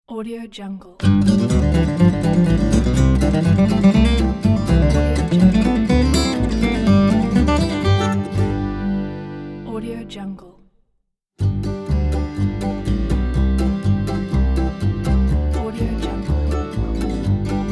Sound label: country bluegrass acoustic logo